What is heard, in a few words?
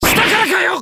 damage light; terumi